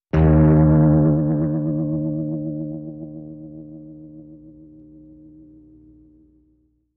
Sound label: Bing, metallic, insecty, deep; bings